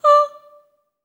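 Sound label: staccato D5, soprano, Voices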